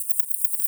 Myotis daubentonii, batcalls, europe

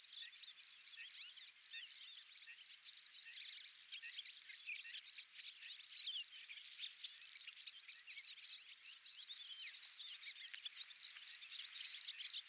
Gryllus bimaculatus

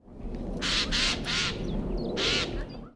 Garrulus glandarius

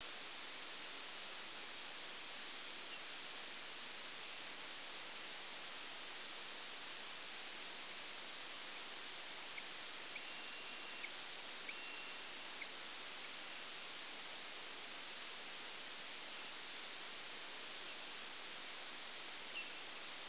Rhinolophus hipposideros

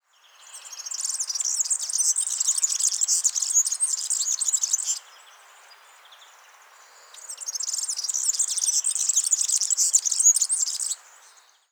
Serinus serinus

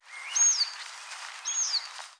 Circus aeruginosus

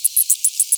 mice

